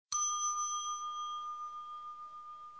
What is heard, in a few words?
ding